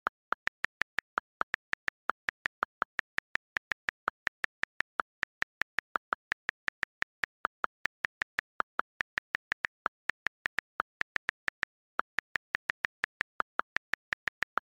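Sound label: iphone keyboard sms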